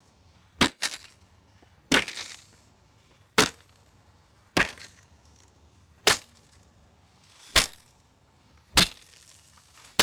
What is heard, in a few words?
arrows striking hard dirt with shaft rattle; weapons